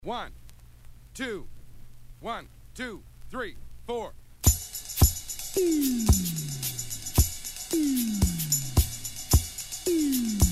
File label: Drum Drops Medium Light Rock